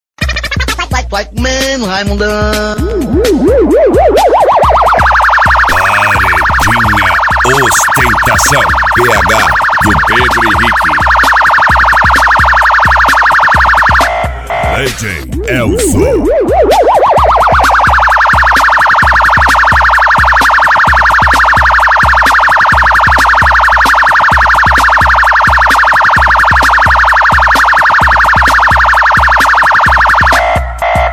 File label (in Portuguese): sirene